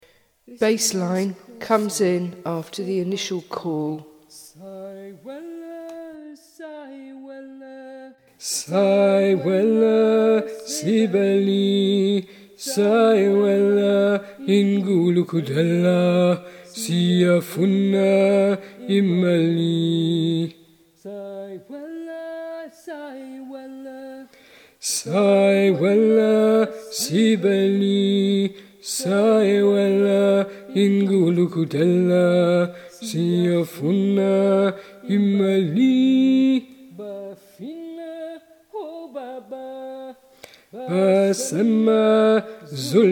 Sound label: bass